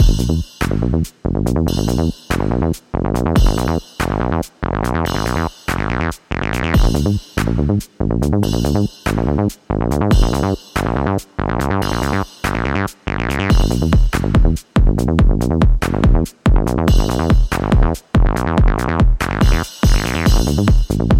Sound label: DnB Psy; dinopark